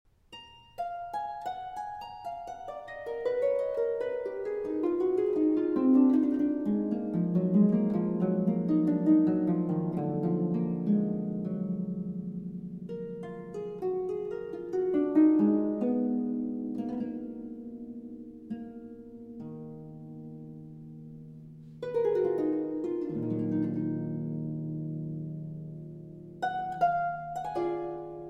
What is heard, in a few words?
Lute Suite in E Minor I. Prelude; BWV 996; J.S. Bach; Presto